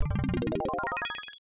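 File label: Boot sound effect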